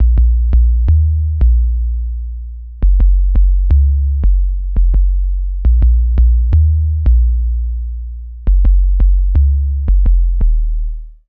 Drum & Bass

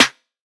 Snare C, Hip-Hop Drum Kit; Drum Oneshots; Snares; Moon; Monosounds

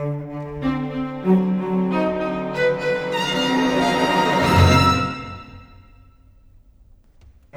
Cinematic Strings, Orchestral; Cinematic 07; Orchestral Series

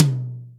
DRY, Acoustic Tom

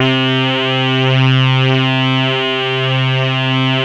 SAW RAVE, LEADS

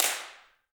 handclaps, Heart of Africa; Spectrasonics; Heart of Africa 1

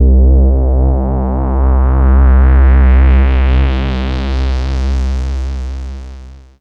Single Electric Basses, Dance Floor Bass

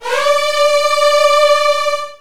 Virtuoso Strings D3; ILIO; Kirk Hunter Virtuoso Strings VOL-2

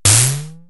Tom, Drumfire DF-500

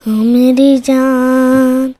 CHILD, Indian Traditions

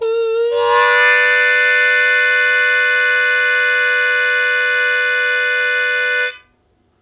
Blues Chord Attack G1, Harmonica; Zero G

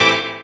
DR. LOOP; X-Static Goldmine 3; CHORDS

